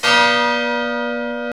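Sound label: bell church; Roland - LA Composer vol. 1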